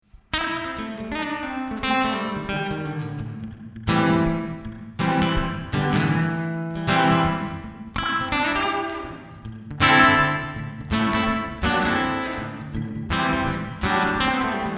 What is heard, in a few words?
mooer shimverb pro digital reverb